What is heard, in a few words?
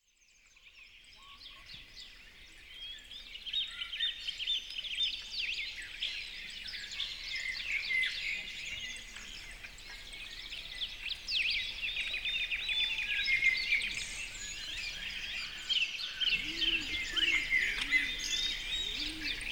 tranquil birdsong natural sounds only; 5 mins